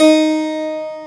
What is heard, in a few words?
HPSI B3; Roland - LA Composer vol. 1